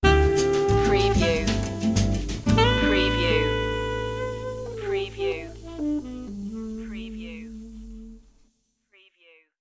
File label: sting